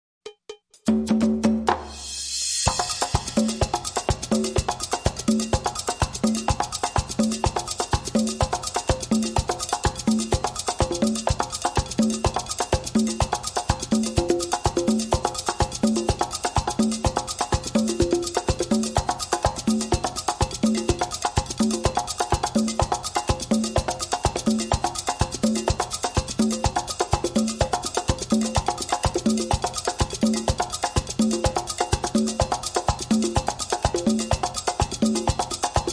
Calypso Loop, No Steel Drum Melody

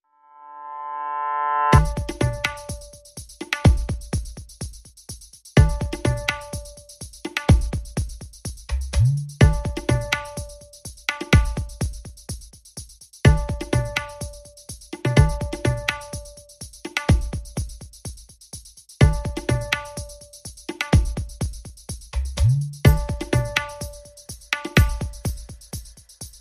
Knock Knock Perc Only

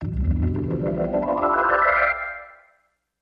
Organ Gliss Up

